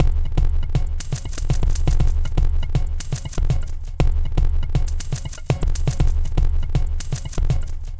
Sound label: Glitched, Processed Percussion